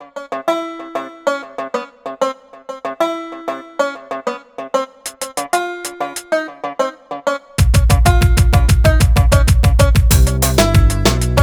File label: 095 Cmin, Hip Hop Exotica; Apple Loops; Big Fish Audio